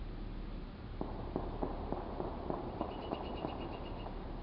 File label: ShotSpotter